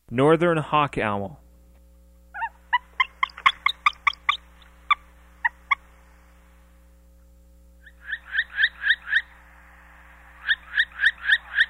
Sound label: Northern Hawk Owl